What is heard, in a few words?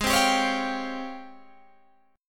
Ab Major 13th, Guitar, Open G